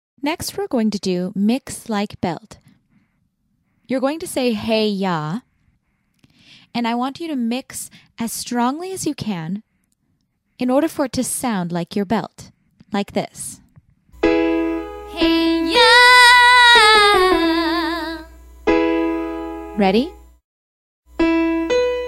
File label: Mix Like Belt